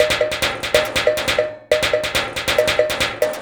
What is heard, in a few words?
Tins Topline (140BPM), household rhythm samples Loops